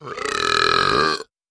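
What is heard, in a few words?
Medium Two Tone Belch; belching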